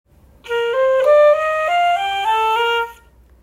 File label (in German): So klingt die Querfloete